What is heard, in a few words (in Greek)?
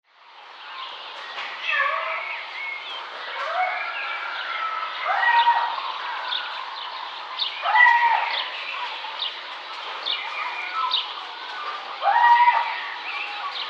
koukouvagia; nanoxina